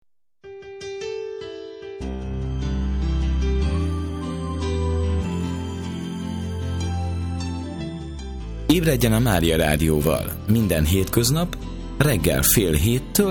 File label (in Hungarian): Reggeli Jingle